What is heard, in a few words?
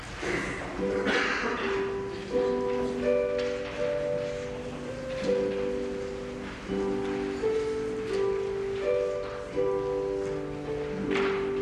harp; prelude